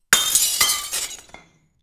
recording

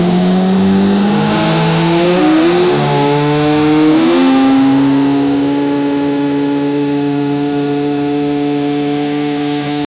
looping sound of a runnin, race car

